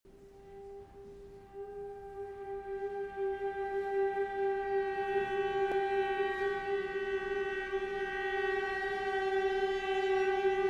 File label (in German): Klagegesang